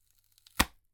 foley wood hardboard thin snap

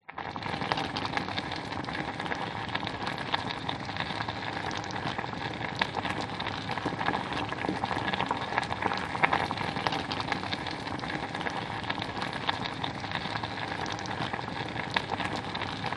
food soup boiling gas stove pan; zapsplat

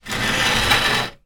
chair wood patio folded drag scrape hard ground; zapsplat

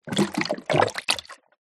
nature water under thin layer ice movements short

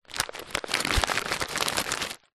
tent pegs in pouch movement; foley

